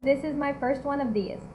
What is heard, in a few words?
beat